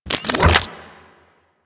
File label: Shotgun Reload; Revenant